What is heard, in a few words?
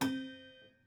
Prepared Piano